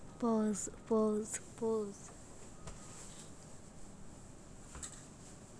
buzz

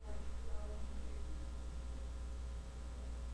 scream

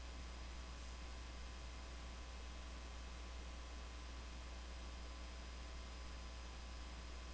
wheel

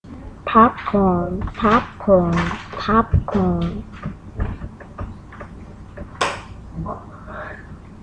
popcorn

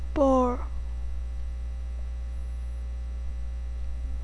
boar

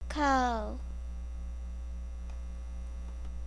cow

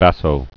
basso

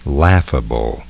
laughable

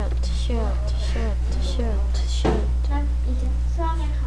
shoot

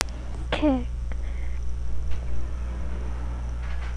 kick

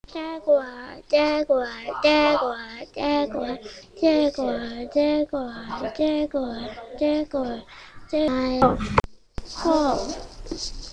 jaguar

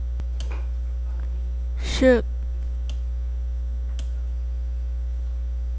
shoot

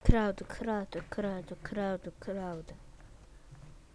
crowd

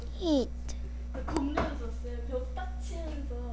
hit

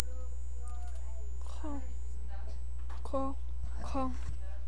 cough

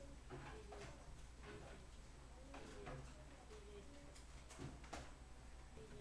metal

